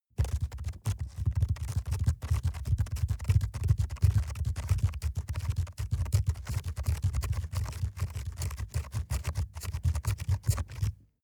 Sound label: rat run trample fast